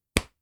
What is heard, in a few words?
Rubber Underpants, Clothes